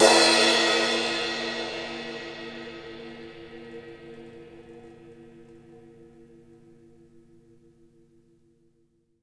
MEDCRSH, CRASHES; Sampleheads; New York City Drumworks VOL-1; KD CRASHES

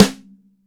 MIX SN, DRY STANDARD SNARE

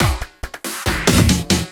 Fills, Loops 140 BPM; Vengeance - Essential Clubsounds Vol.4

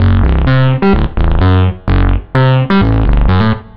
Synth Bass

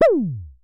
Synare Filter; Star Instruments Synare 3

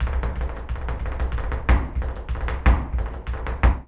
Flamenco Tapping Zapateado Solo

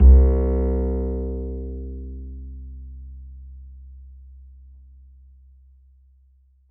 E, E string upright bass; Larry Seyer

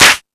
Discoball, Drum Machines E-MU Pro-Cussion

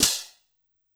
Hand Cym. Short H, Yamaha PSR-E323 Symphony Kit

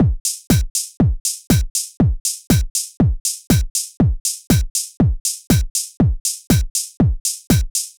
120 trance beat, Drum & Perc Loops; Trance Explosion Vol1

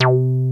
303 PLUG, BASSES; Pro-Rec - Synthsonic

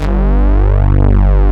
OB STRINGS, OB Synths, Analog; E-MU Formula 4000 Series Vol. 3; Analog Odyssey

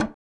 high tom; Giga Samples Collection; EMUSP12

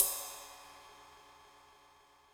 Ride’EM, Yamaha DTXpress Cymbal

